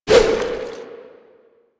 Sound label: Water Balloon Fire; Warframe; Water Balloons